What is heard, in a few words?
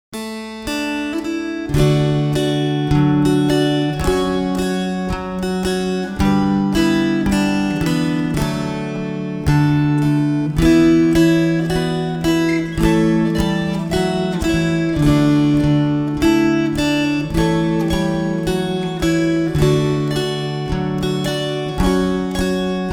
Rag slow